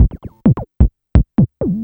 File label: Drums Strange